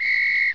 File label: whistle